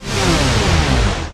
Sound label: Menu Powerdown; Menu FX